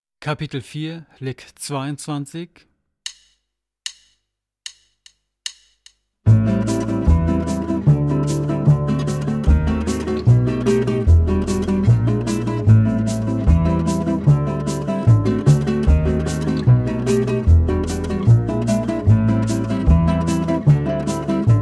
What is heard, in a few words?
Latin Style Guitar